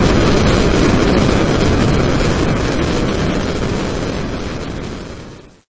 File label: Explosion lab chirp